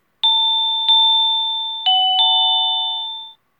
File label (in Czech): melodie